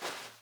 fs carpet medium; stanley parable

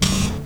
button up; portal

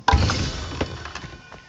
chest open; kena bridge of spirits

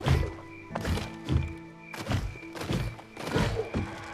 jump series treetrunk; ori and the blind forest

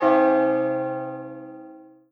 tab religion christian; europa universalis iv

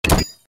ui select; The Witcher

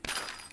environment sound pickup multiple metal screws

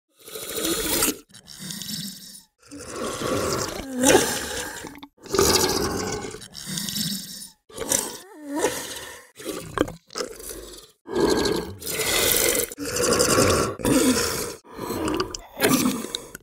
shambler; The Last of Us Part II

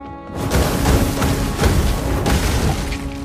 fire torch attack; ori and the blind forest

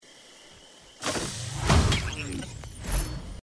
chest opening; risk of rain 2

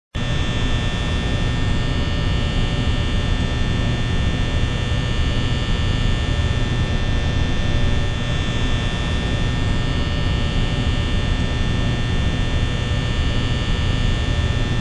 fan; five nights at freddy s

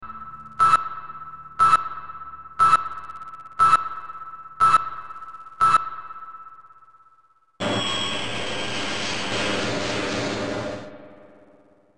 turret; half-life

